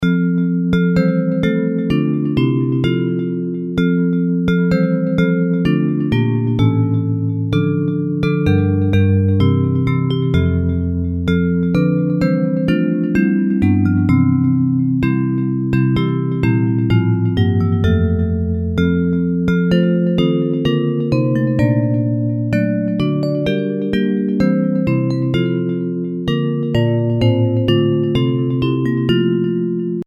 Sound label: Bells; Hymns